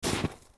FS Snow, footsteps

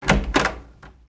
door wood open; Max Payne

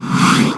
Score Slide In; Finding Nemo; XBOX

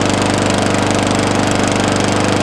leaf blower engine loop; vehicles; Tony Hawk's Project 8; XBOX

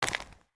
FS Dirt, Footsteps

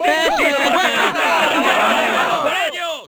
burst, Crowd

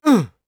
attack voice; UFC Tapout

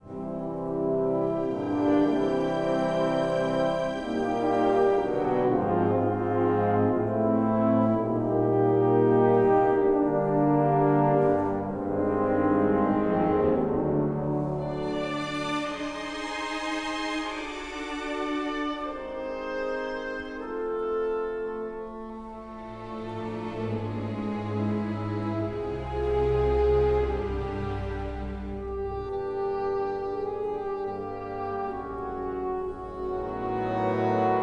music flourish

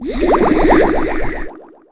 Object Falls; Finding Nemo; XBOX

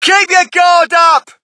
crowd, shout; Mike Tyson Heavyweight Boxing

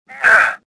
jump; Star Wars Jedi Knight II Jedi Outcast; XBOX; stofficer

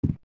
step, footsteps

